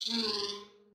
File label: insects deep mud; factorio